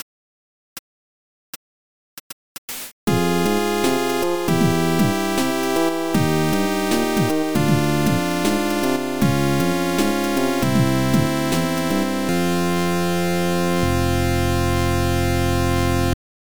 Gameover Beta

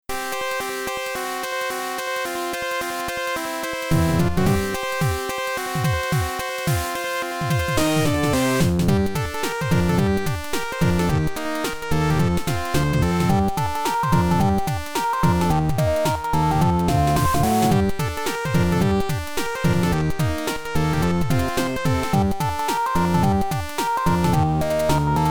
Internal Percussion; Cave Story Beta

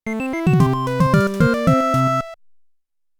Fanfare; rockfish-2011

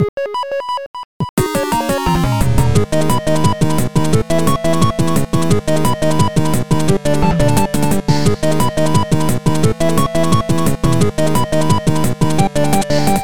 Gravity (Internal Percussion)

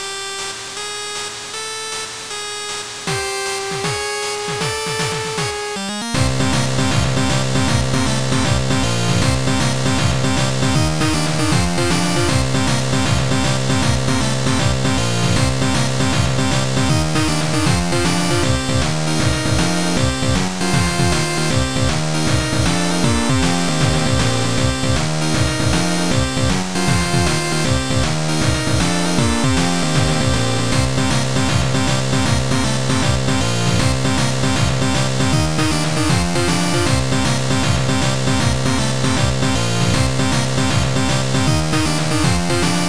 Internal Percussion

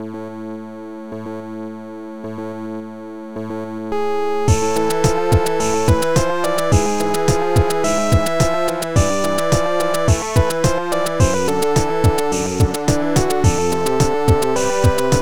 Internal Percussion

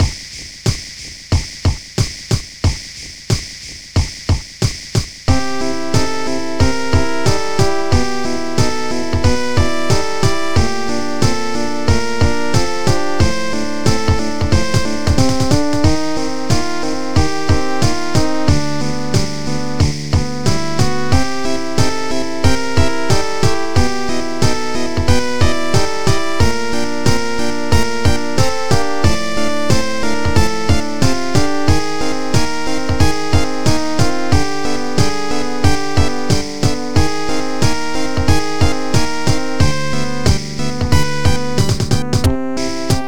Safety (Internal Percussion)

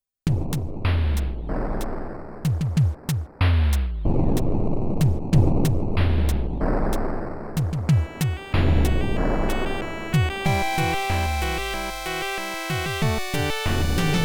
Internal Percussion; Cave Story

